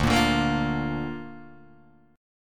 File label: D Minor Sharp 5th D down Guitar DADGAD